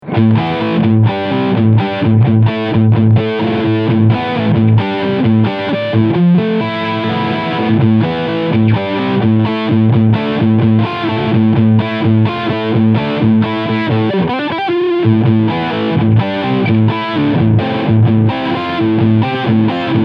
Ibanez JEMJRSP PK Riff Sound Steg Pickup

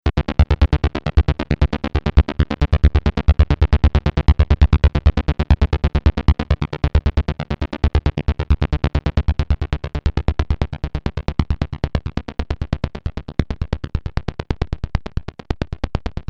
Huellkurve Amplifier

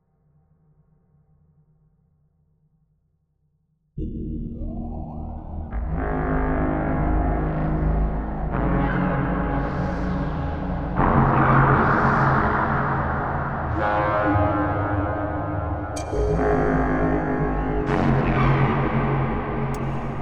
KORG wavestate Langsame Sequenz Stereo Out

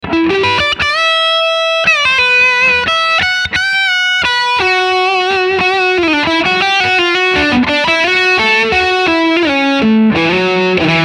Steg HB Overdrive Lick

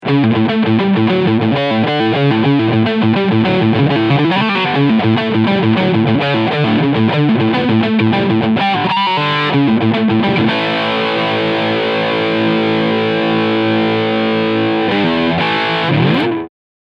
Fender AV II 75 TELE DELUXE Riff Overdrive Steg PU

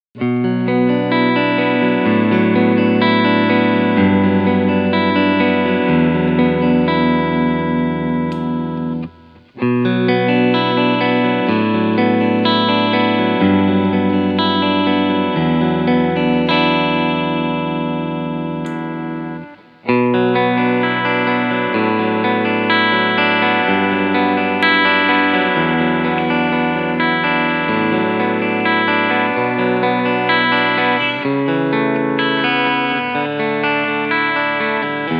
Surf Green E Gitarre Cleansound alle Schalterpositionen; GL Tribute Doheny

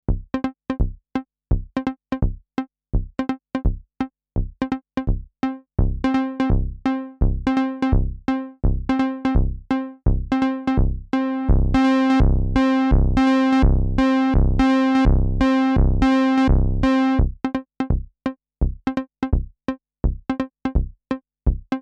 1073 Preamp Vergleich Warm Audio WA73 Synth 35 dB Tone

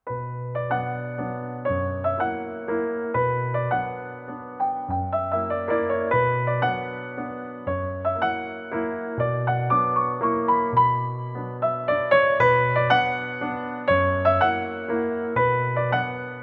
Nord Grand Walzer h-Moll Grand Imperial; Schubert

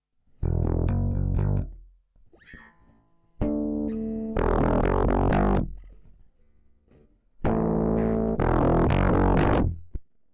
Wavefolder Vergleich Joranalogue Fold6 E Bass

